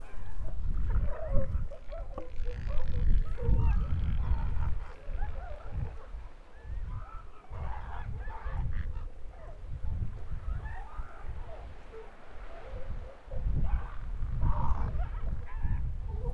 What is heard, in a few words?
Seals on far beach